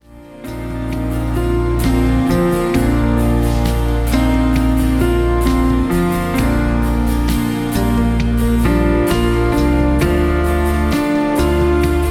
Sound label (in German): Entspannungsmusik mit Klavier